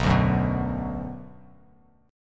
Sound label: B Minor B, Bass Standard; strummed; JGuitar